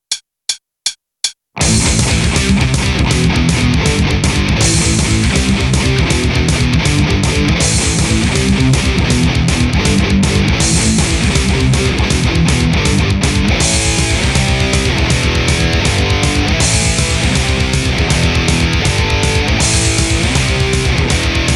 heavy, bass; jens goers

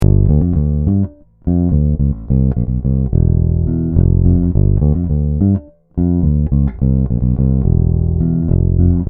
neck, bass; bassart relicmaster

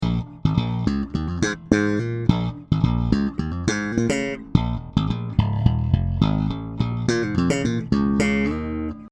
tribute5 both series trebleboost

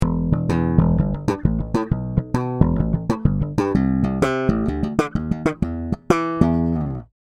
slap, bass; fleabass junior

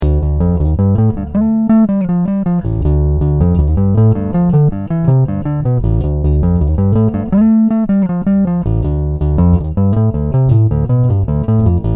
epiphone eb 0 Pick, bass; ssb

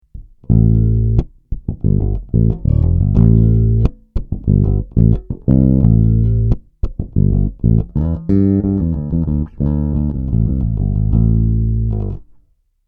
passive neck, bass; nature shock fusion5

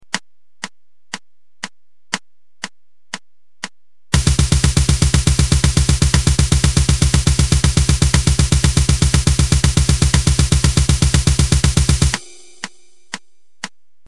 hammerblast

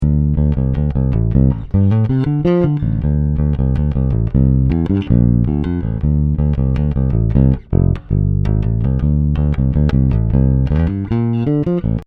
harley benton ssb, bass; 10 1 harley benton ssb

